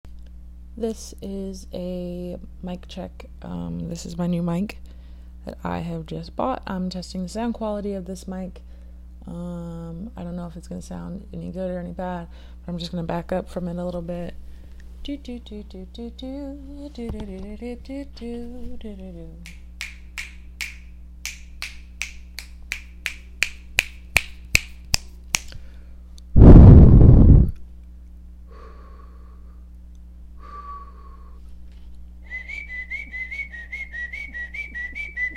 Bloopers, Singing; Behind The Scenes; Erotic audio

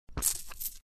Suck; Lewd SFX

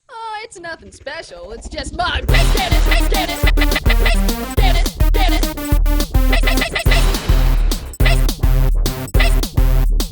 canon

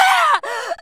Grunt; Lewd SFX

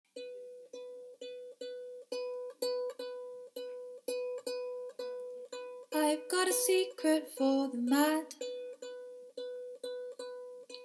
cover, singing, ukulele

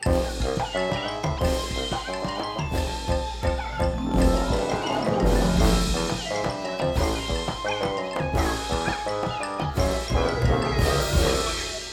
(Instrumental); My Little Pony OST Repository; Season 1; Bonus Music

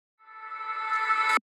Rev

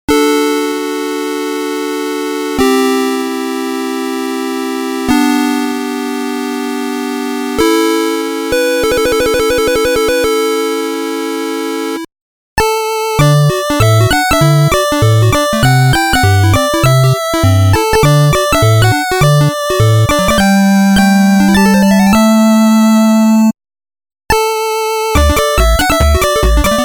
8-Bit; You've Got to Share

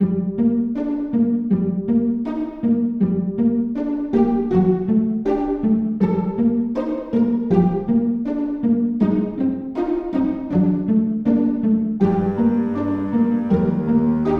Cello Music

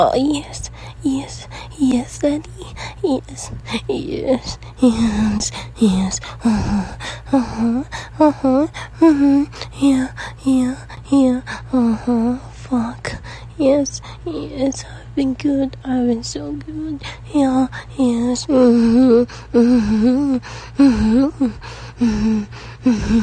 Moaning; F4M; Ramble Fap; Goodgirl; I been good

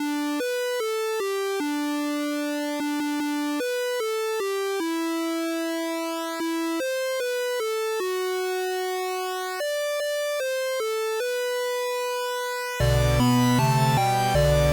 JINGLE BELLS MIX

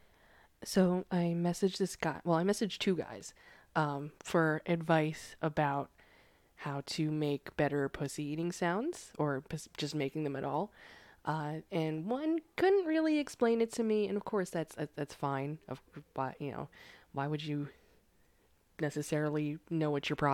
Mouth Sounds

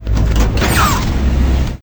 Door Opening

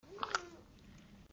slosh; Lewd SFX

